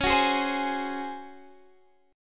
Db Major 7th Suspended 4th Db down; strummed

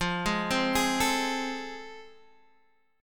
A Minor Major 7th Sharp 5th, F

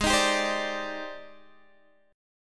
G sharp Major Sharp 11th, Guitar Standard; G#M#11 strummed; x,11,13,12,13,10; JGuitar

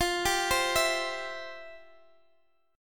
F Major 7th Suspended 2nd, Guitar, Standard; arpeggiated; FM7sus2; x,x,15,12,13,12; JGuitar

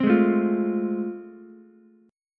A Minor Major 7th Sharp 5th, Ukulele; strummed; AmM7#5; JGuitar

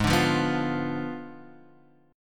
G sharp Diminished 7th, Guitar, Standard; strummed; 4,5,3,4,6,x

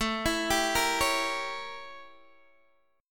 A 7th Flat 9th Guitar Open D

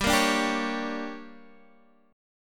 D Major 7th Suspended 4th Sharp 5th, chord

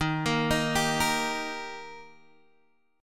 D sharp Major D sharp Guitar Open G, chord; x,8,8,8,8,8; chordshape

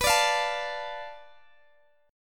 B Minor 7th Flat 5th B Guitar DADGAD

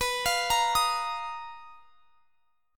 B Major 7th Flat 5th B down, chord shape; arpeggiated

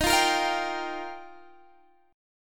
F 7th Suspended 2nd Suspended 4th

